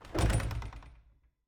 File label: Cabin, front door, split door, oak, large, iron fittings, open, wide; Eiravaein Works; Vaeyan IV